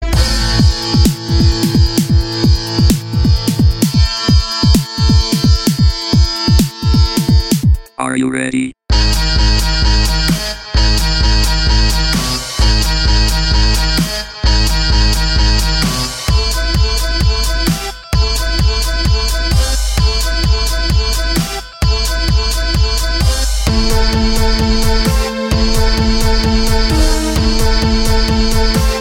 spacey

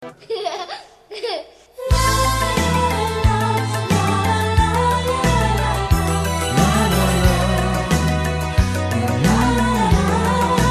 very nice baby melod

